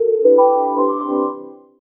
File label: chill notification